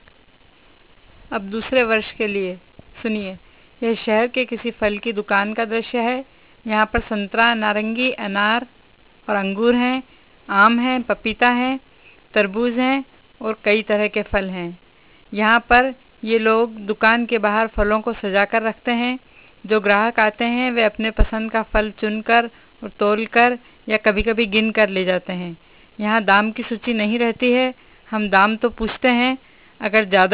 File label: market sound; India